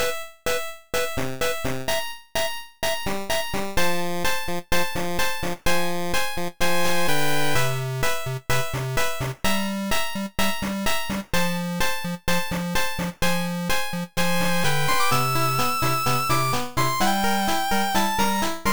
Bosque Verde 8bit; Bosque Verde HGSS

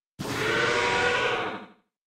Grito de Dracovish

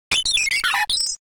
Grito; Starly

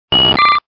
Grito de Goldeen

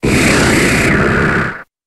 Grito de Alakazam

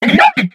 Grito de Watchog

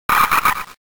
Grito de Nuzleaf; Gritos de Pokémon de la tercera generación

